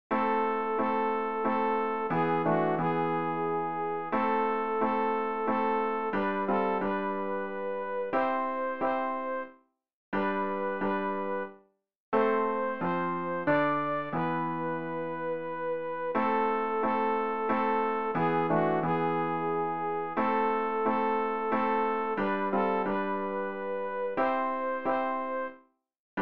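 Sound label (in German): sopran, Übehilfen; Ref. Gesangbuch; RG 073: Laudate Dominum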